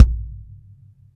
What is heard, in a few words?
BD, Bassdrums, Roland TD-20